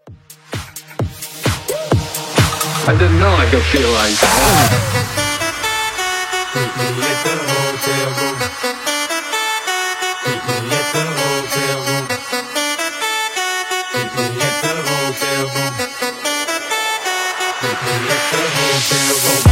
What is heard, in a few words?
Mashup Bass House 130